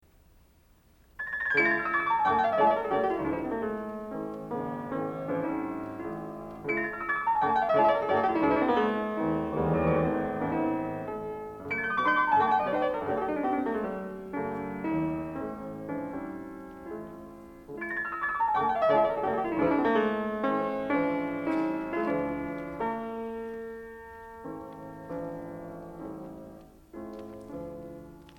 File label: Prelude In C Sharp Minor; Chopin; Op. 28